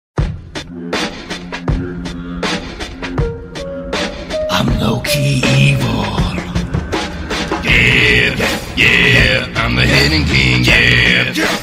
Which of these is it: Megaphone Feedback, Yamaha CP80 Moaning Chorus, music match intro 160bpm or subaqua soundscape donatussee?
music match intro 160bpm